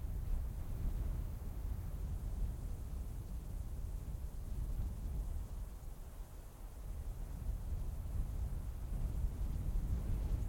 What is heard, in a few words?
Ambient background; DCS